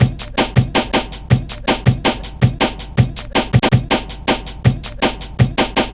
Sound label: helicopta, drum loops; Zero-G Jungle Warfare 1, 2 & 3